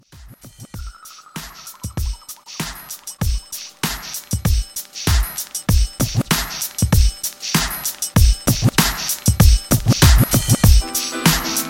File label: 90s RNB Re Drum